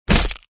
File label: attack hit; sprunki simon evolution pack